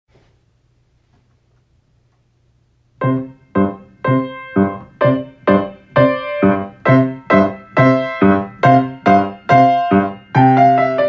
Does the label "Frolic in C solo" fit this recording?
Yes